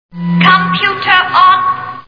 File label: computer on; Star Trek